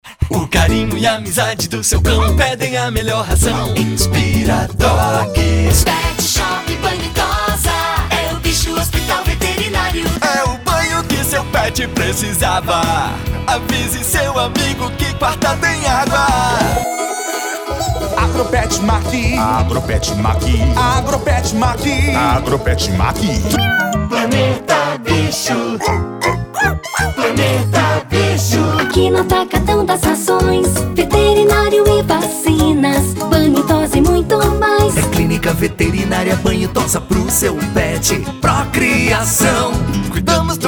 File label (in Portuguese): jingles pets; mundi pets